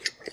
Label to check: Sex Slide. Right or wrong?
Right